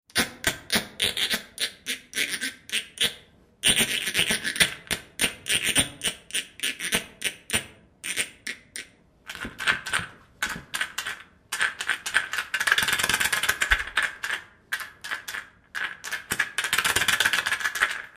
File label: scraper shaker; thingaday